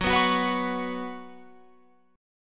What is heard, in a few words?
Gb Suspended 4th Gb, down, Guitar, Open F; Gbsus4 strummed